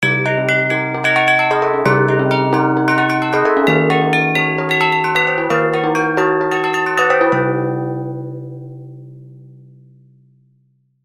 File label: BRAK DRM; eShop